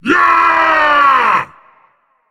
battlecry; smite; hades

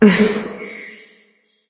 disagree light; smite; voicepack; hel

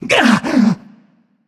breath; smite; ahpuch

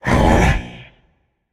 confused, voicepack; smite; jorm

